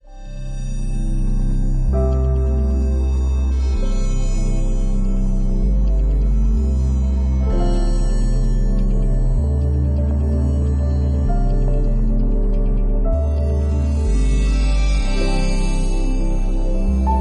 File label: camp, musique